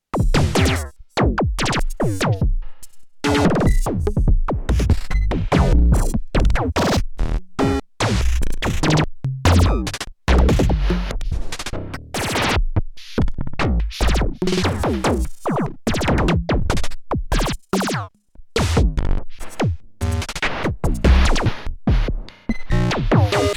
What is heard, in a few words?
Random Play Mode; Erica Synths Perkons HD 01